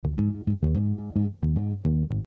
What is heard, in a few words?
Bass